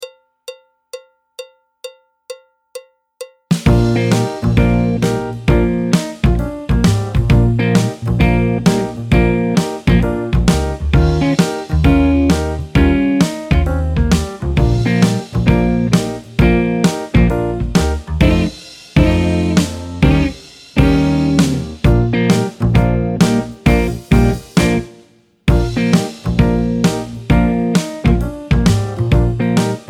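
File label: backing track, Jazz; Jazz Syllabus Level 4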